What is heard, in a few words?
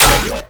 Low Clip, Infested Arm Cannon, Weapons; Warframe; Coda Bubonico